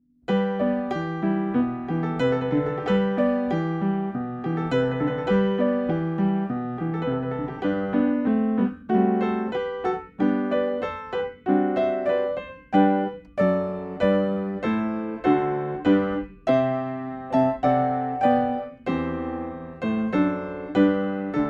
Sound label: ACCOMPANIMENT